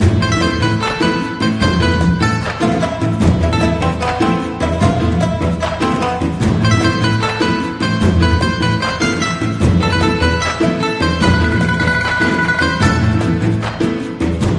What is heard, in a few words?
bgm